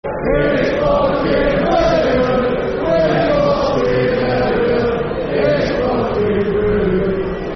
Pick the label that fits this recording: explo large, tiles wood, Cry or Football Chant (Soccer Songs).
Football Chant (Soccer Songs)